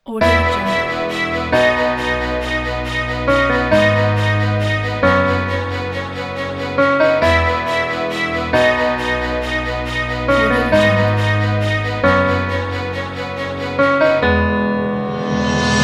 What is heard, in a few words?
epic orchestral pop rock